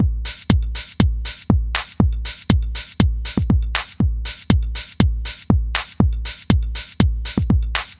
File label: House Loop 120